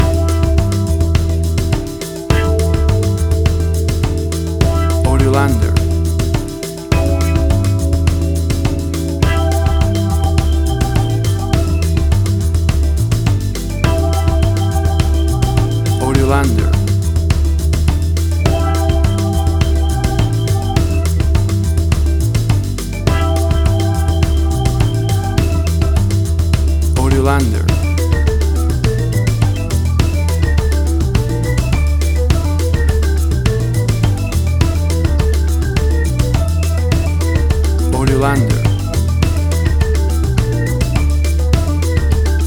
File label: Bossa Nova Latin Acid Jazz Music; A fusion mas; AUDIOLANDER